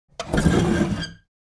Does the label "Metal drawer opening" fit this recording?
Yes